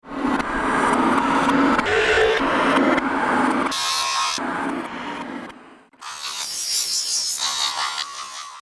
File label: ping ring